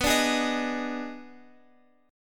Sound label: D sharp Major 7th Suspended 4th Sharp 5th B